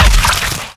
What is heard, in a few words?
headshot